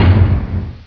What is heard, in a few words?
rock thud